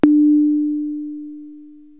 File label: Conga, Drum Machines